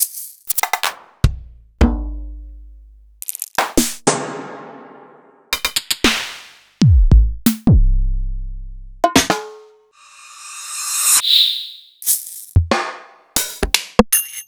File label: EMU Orbit